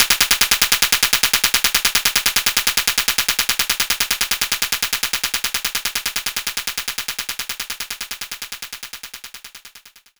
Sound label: hand clap; knights try